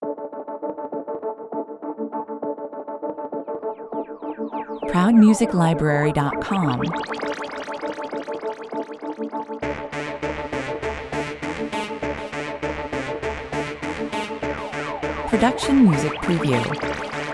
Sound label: dark skies (bed, no drums) 100 bpm